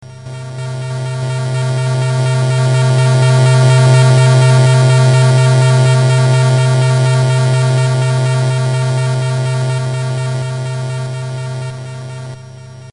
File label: drone bbc micro; FireTrack